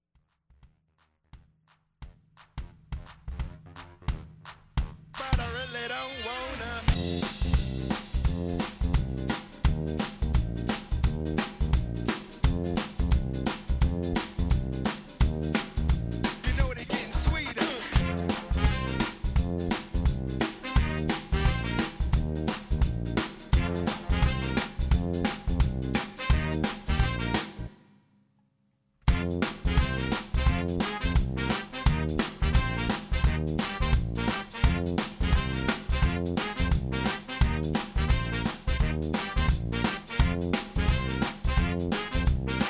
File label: Pop